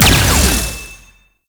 Proj Hit; Enemies; Abilities; Warframe; Tau; Dax Ninja

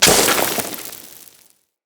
Melee Impact Dirt

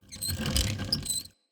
Witch Station Workshop Rattle; Ambience; Soul Plane

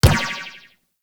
Ball Bounce Default; Warframe; Tenno Ball; Missions

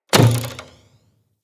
Bow Shot Charged Twang; Weapons; Player; DendritBow

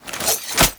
Idle Sword Grab; Arthur Hub; Nineteen Ninety Nine

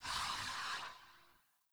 Generic Footstep; Warframe; Choir; Foley

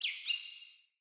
Bird Double Chirp; Josalfar Bird

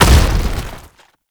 Tank Infested Step; Enemies; Movement; Lasrian Tank; Warframe

